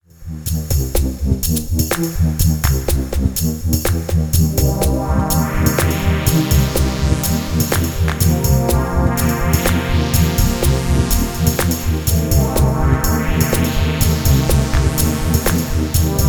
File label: OBxd Filter Reverb; Zynthian Open Sound Plattform; Zynthian Ensemble